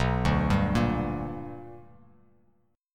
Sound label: B Minor Major 7th Sharp 5th Bass Standard; arpeggiated; JGuitar